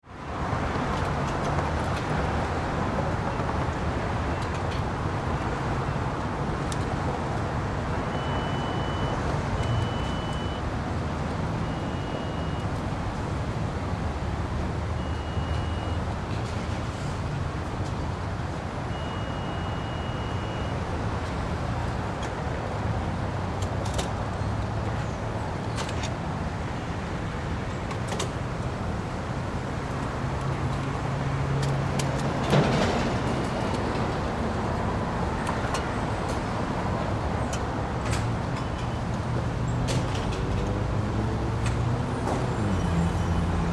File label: Ambience Gas Station Exterior; Gas Pump Sound Effects; Gas Pump (Sound Effects:Machines)